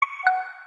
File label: bubble; lg mobile; meloboom